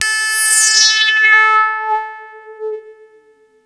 Arp E A4, ARP Odyssey; musicradar-synth-samples

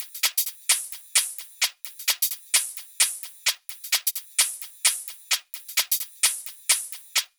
130BPM Kit; Vengeance Samples; Take Top Kit

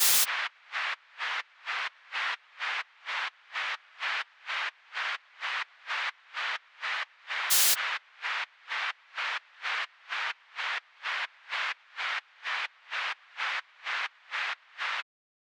128BPM FX; Vengeance Samples; Basket Kit

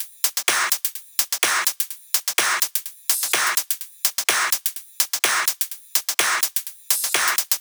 126BPM Kit; Vengeance Samples; VFH2 126BPM Secrets Kit

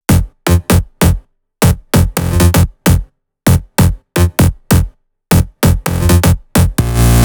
130BPM Jig Melody; Vengeance Samples; DK Jig Kit

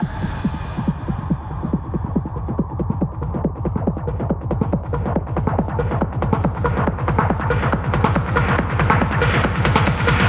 140BPM 16 Bars, HandsUp Trance Fills; Infinity; Vengeance Samples

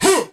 Vocal Oneshots; Vengeance Samples; Vocals

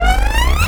Sweeps Short

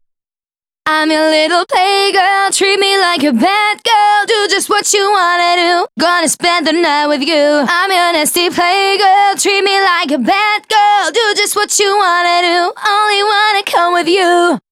Female Vocals DRY, 140 BPM; Vengeance Samples; Vocal-Hook Kits; Song Kit; Play Girl

